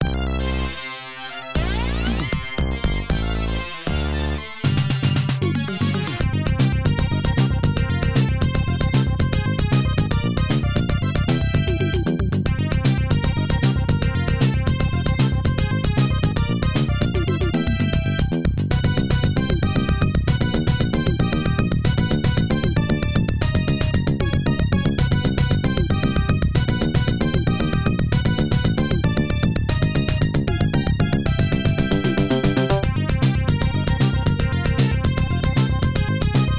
Stage 5 BGM; Burn the Wind

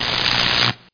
Zap; The Crow City of Angels

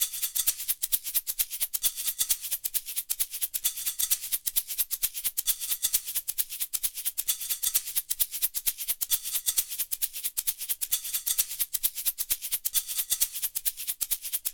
Shakers; super crush k.o.

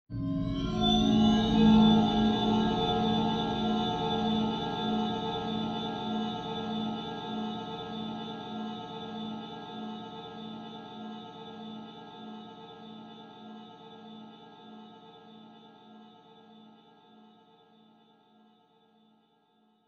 stinger; Joe's Diner

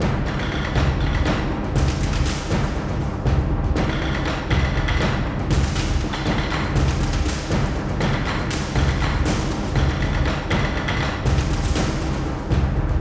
Combat Mini Boss; Dungeon Hunter; android game music

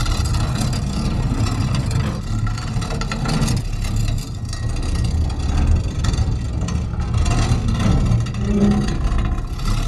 metal slide loop

